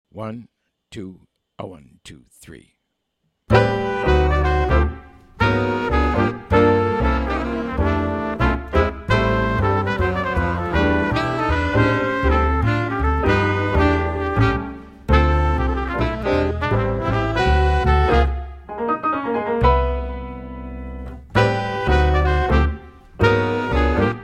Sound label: minus Drums